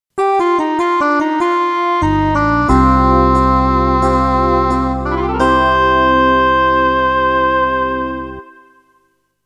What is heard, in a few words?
gameover